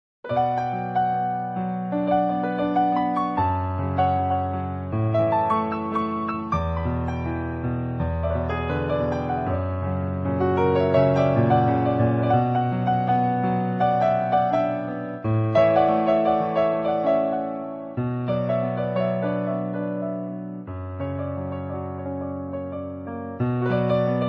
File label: Piano